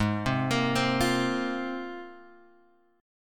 Ab 6th Add 9th Ab, Guitar, Open G

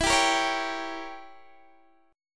E Diminished 7th E down Guitar Open D

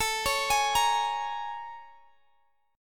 Bb Minor 7th A; Bbm7/A arpeggiated

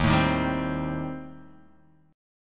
Eb 6th Flat 5th, Guitar, Open D; 1,0,1,1,3,x

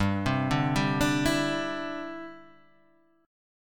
E Minor 7th Sharp 5th G

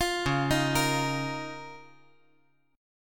C sharp 6th Add 9th C sharp down

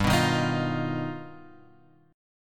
G Augmented 7th; G+7 Chord {3 2 1 X 4 1} strummed

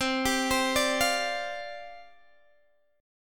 C Suspended 2nd Suspended 4th, C, Guitar, Open G; down; chord shape

